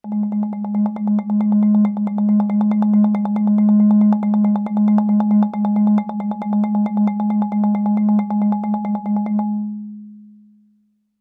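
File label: Marimba roll fortissimo Ab2; MIS Pitches - 2014; Percussion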